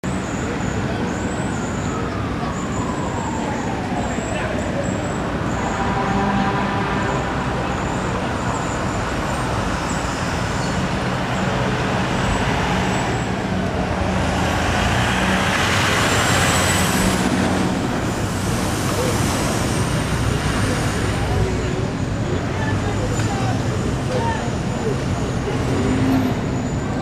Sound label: traffic